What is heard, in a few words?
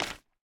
resin hit; block; minecraft